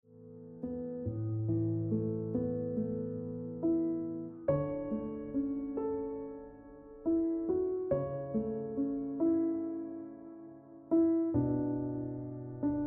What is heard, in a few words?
Ringtone